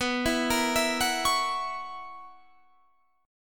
B Major 7th Suspended 2nd Suspended 4th B down Guitar Standard; arpeggiated; capo=2